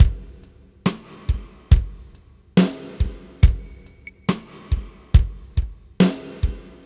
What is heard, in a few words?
Slomo Beat 140 BPM, Loops, Slow Motion Beats